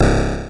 bump

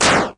break decrease health

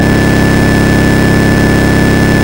buzz

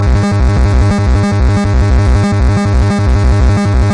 trance

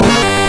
machine

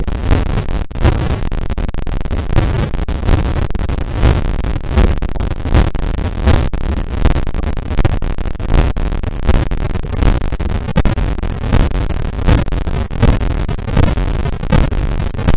scratch

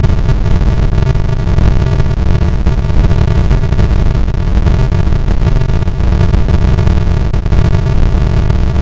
deep

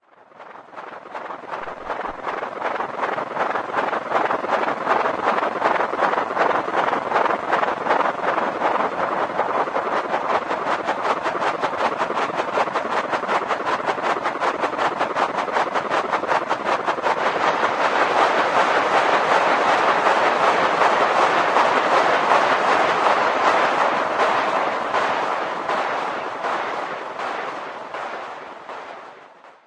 epic swooper

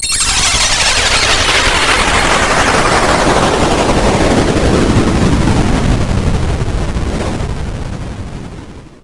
angry down

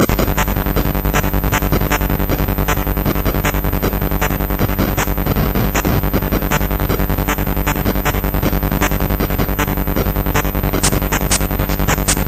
steady

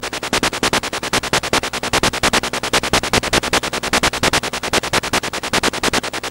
panic

